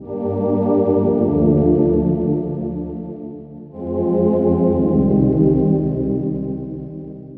Pad Loop 130 G; Loops; Pads; Techno; GhostHack; Ghosthack - Free Techno Sounds 2024

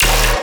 Bass Hit D#, Dubstep

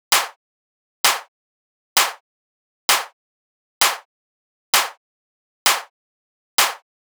Clap Loop 130, Techno

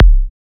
Kick F#, Psy Kicks; Drums; Ghosthack; Ghosthack Advent Calendar 2017 Day 9 - Psy Kicks; Ghosthack 20 Free Psy Kick

